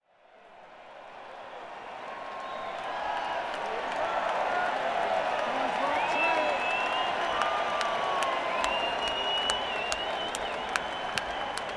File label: Crowd; Las Vegas, NV - MGM Grand Garden Arena